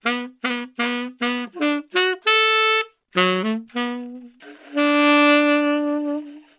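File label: saxophone, Funky Drum loops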